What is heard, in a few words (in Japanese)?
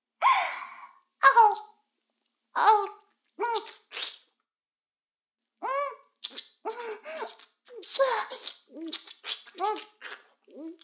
フェラ音声; 逆時間停止されて